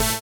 SYN, dance; Sound & Vision - Gigapack II

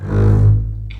CBS G#1 MARCATO, String Collection; East West - The Ultimate String Collection

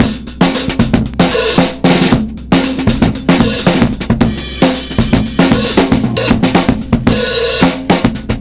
28 114 bpm, Loops Breaks; POTBreaks

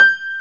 PNO Grand Pno; Club-50 - Foundations Roland

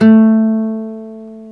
GTR nylon; Club-50 - Foundations Roland; Nylon Martin

